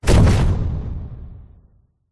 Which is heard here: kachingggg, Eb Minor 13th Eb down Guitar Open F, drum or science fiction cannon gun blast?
science fiction cannon gun blast